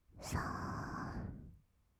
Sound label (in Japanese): 吐く音, ブレス, kawaii voice; ASMR; 1200 kawaii voice!!